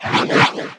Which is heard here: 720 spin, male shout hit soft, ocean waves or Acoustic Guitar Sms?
720 spin